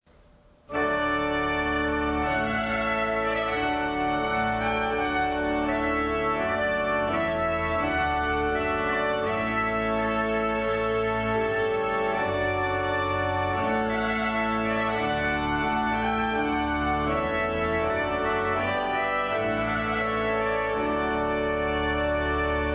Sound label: Trumpet Voluntary